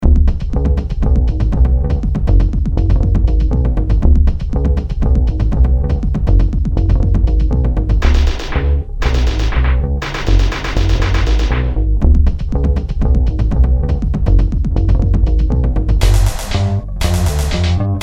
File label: bassloop; ACID